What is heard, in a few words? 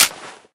hunting rifle clip locked; Left 4 Dead; Source Engine